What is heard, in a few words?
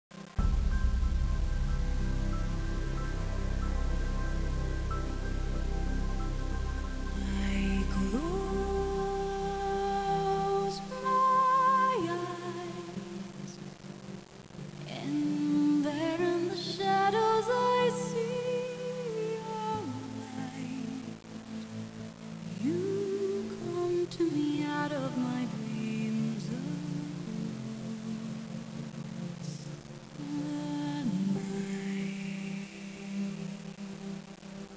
duet soft